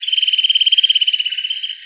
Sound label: Bird, ambience